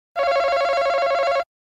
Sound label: buzzer sound effect; family feud